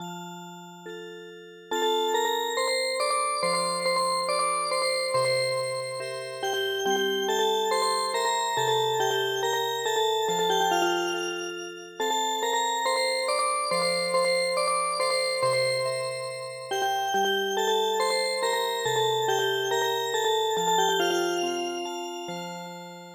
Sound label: Cradle Song Music Box; Italian; europe